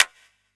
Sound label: Elec Clap, drum